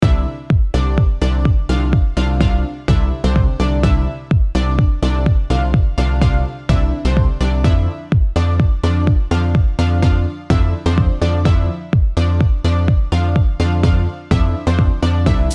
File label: Positive Cheerful 126; NEWS STANDARD BGM Vol.2